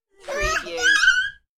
Toddler Squeal; human sounds

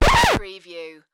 Record Scratch; Sound Effects Bible

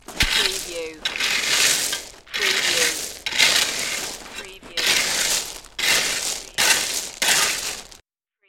Raking Gravel; stone

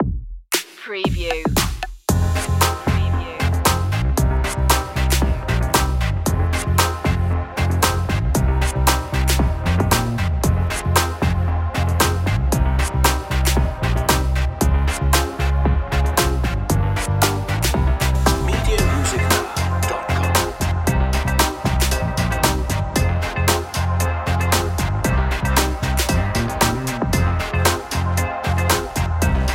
cool style, A funky and intense electro track; urban-rnb; hip-hop

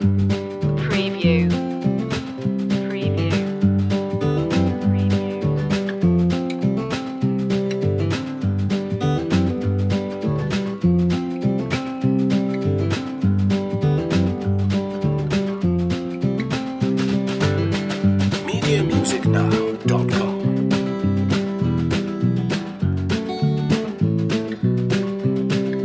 loop; trails

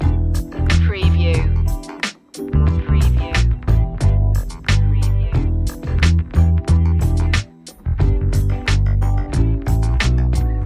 groovy baby loop; Funky / Groovy

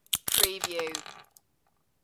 Nutcracking

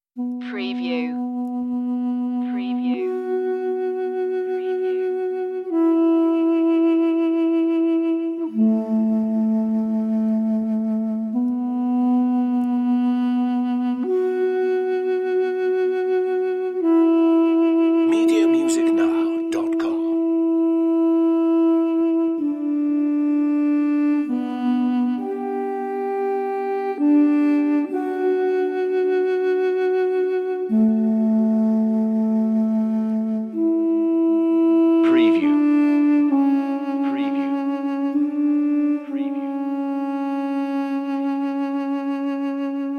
duduk theme

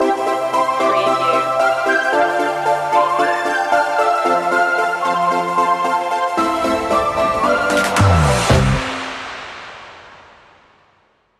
Pop-Rock; stinger

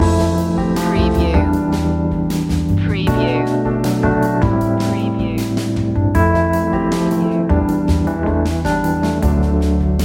central groove loop

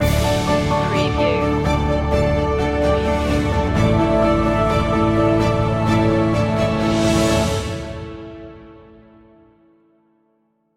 how far can we go stinger; orchestral soundtrack; adventure fantasy

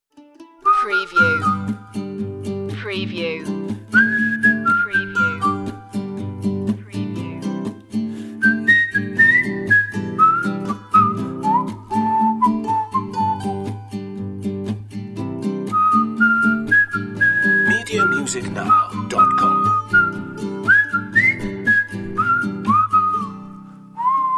whistle version; off-beat

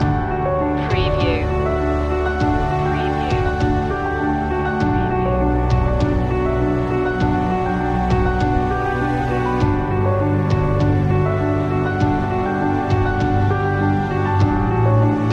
loop

